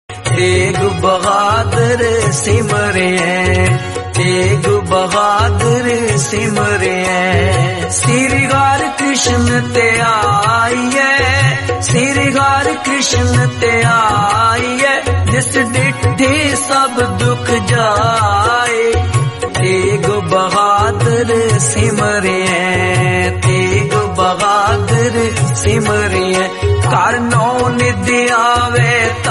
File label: punjabi gurbani kirtan